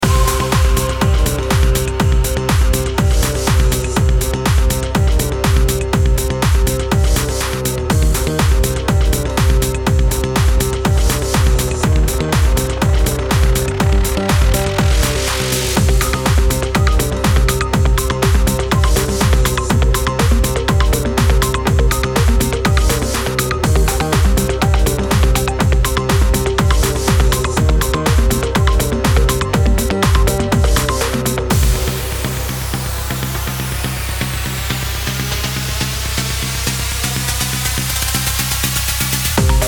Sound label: trance fitness exercise loop